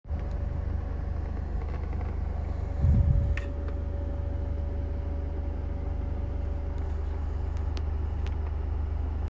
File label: glc250d whistling noise engine noise sound; glc-class x253